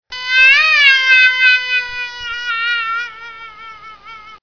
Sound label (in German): hasenklage